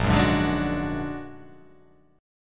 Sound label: A sharp Augmented 7th A sharp, guitar